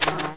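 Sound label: wasp wing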